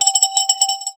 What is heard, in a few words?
granular snap bursting in, fragments